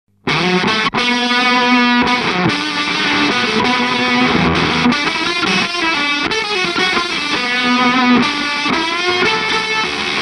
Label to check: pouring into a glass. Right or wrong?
Wrong